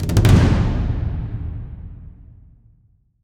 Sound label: Stab Bad; Brink; Resistance